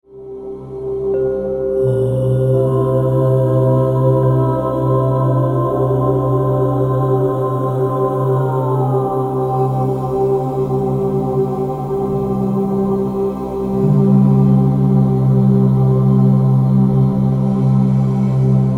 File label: Om Symphony, Channeling Inner Peace